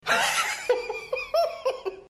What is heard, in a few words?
laugh; joker